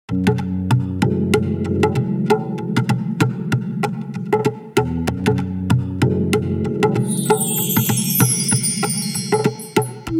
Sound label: Acapella